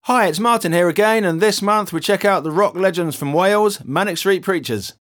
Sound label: Rock intro; Guitar Techniques 2019 Issue 294 Spring